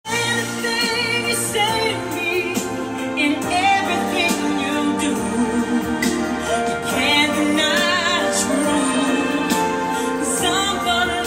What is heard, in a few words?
Living Proof, Tenor